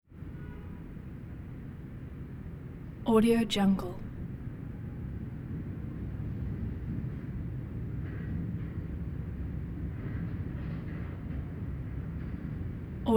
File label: hotel hall traffic quiet airy room tone